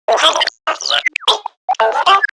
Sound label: Garden Drone Vocal; Warframe; Zariman; Zariman Gardener Drone